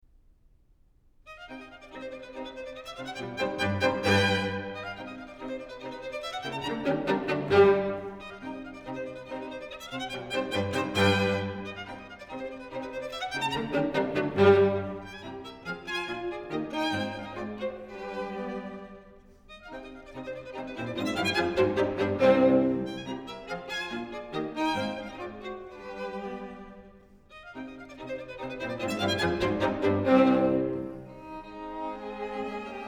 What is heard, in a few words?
String Quartet No. 4 in C Minor, Allegretto; Ludwig van Beethoven; Beethoven - String Quartets No. 1-6, Op. 18